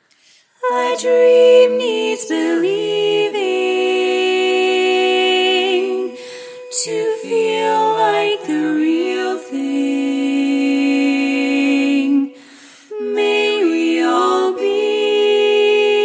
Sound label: Lead, barbershop